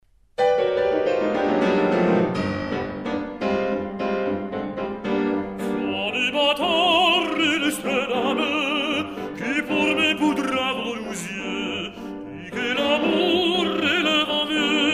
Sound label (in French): Chanson a boire vario